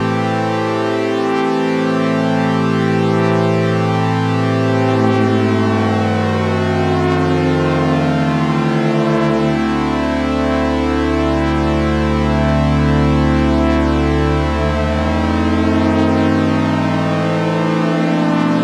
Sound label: A min sliding saws; daily samples